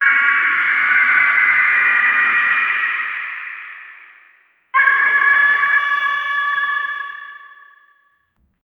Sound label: hyena cry but slightly, animal call